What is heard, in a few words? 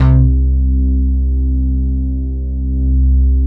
WAVE; Masterbits - Tekkno Therapy